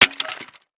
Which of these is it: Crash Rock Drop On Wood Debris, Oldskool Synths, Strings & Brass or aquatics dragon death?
Crash Rock Drop On Wood Debris